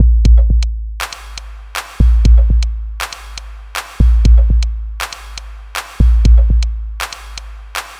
Fresh Drum Loop

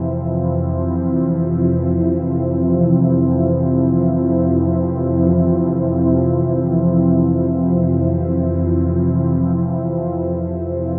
Calm Hopeful Drone Loop

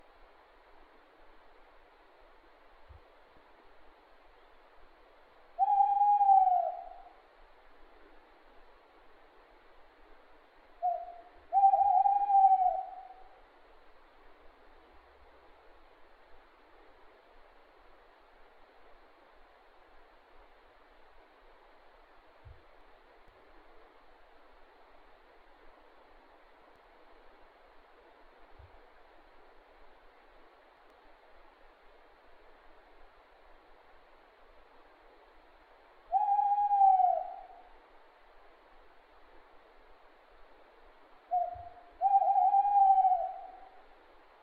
Owl; Inchadney

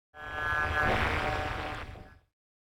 Short Circuit

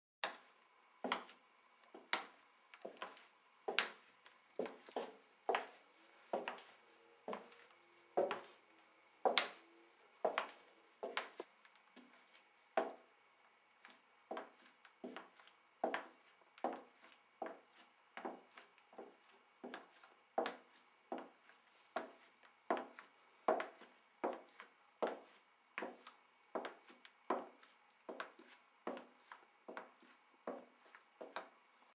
Footsteps

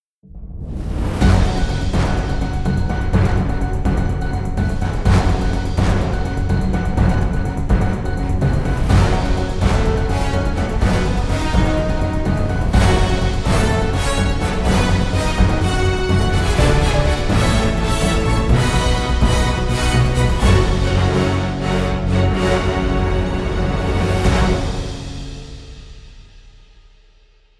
Breaking News Intro Music

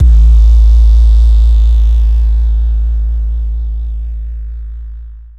Bass

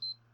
Ps3 Default Beep

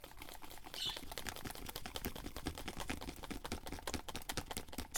Stirring Pancake Batter

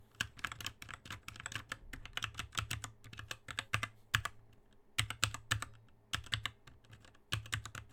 Typing

